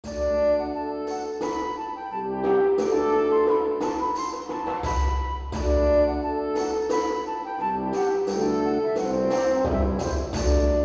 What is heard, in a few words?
Jingle Positive; The Guild 2 Renaissance